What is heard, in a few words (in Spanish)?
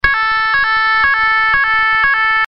tono